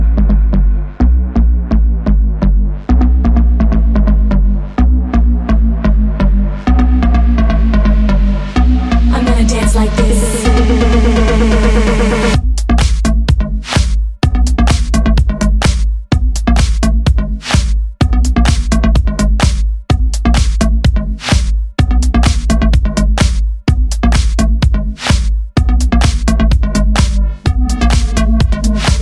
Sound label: Pulse